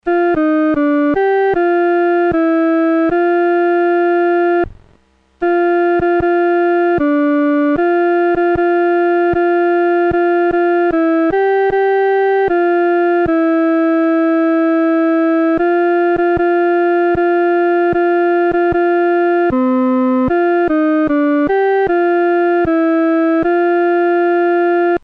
独奏 第二声

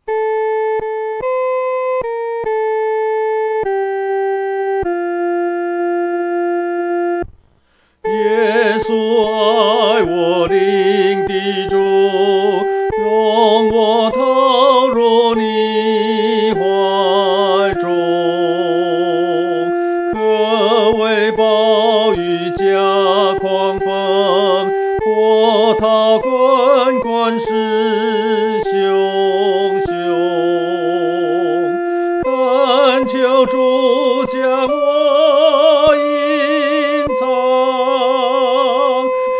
独唱 第一声

